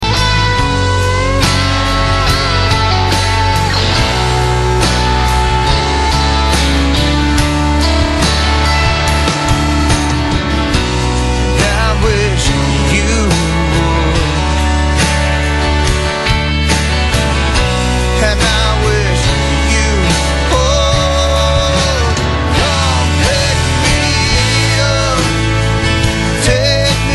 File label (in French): folk la, guitare